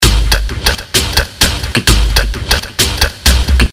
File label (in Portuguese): batida funk